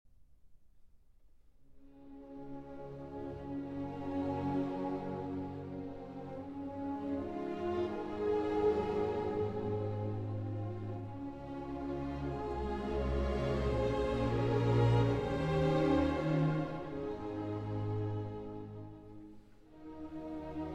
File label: Piano Concerto; Grieg; in A Minor